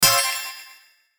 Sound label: button hit shimmer; Multimedia; Buttons